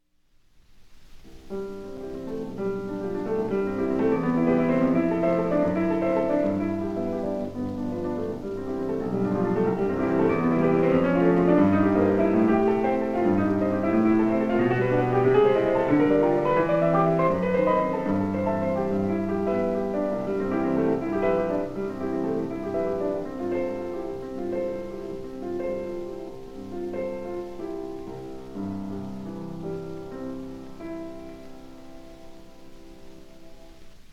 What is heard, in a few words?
Prelude in C Major